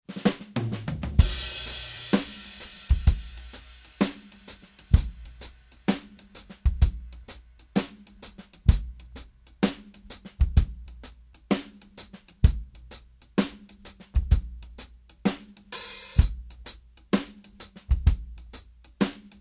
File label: 70s blues